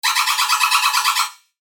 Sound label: Pluck Shot C; synth shots tech house; Seizure; revealed